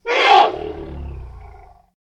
Mammoth Attack Vocals

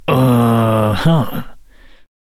Deadeye Attack Vocals

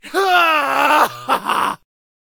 Harpooner Attack Vocals

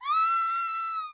Valkyrie Attack Vocals

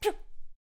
Poacher Attack Vocals

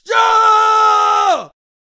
Super Boxer Attack Vocals

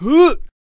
Chieftain Attack Vocals

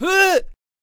Attack Vocals; Chieftain

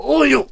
Monk Attack Vocals

